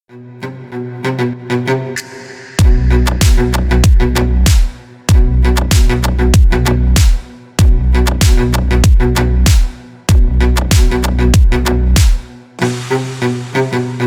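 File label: club new bass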